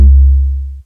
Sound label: Kick Power; algorave